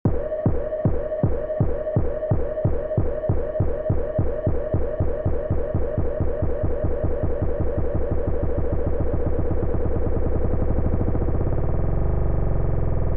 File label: Noise